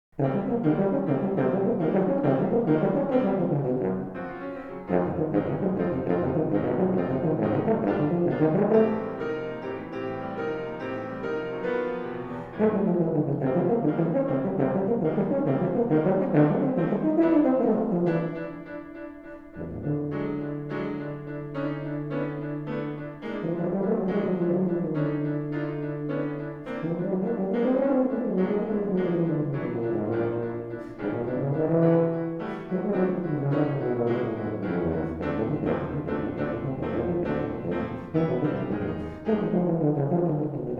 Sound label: miniatures for tuba and piano; three miniatures for tuba and piano; Tuba Music